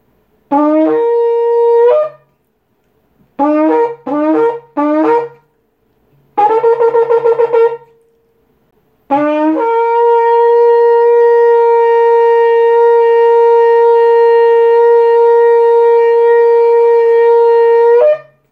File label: shofar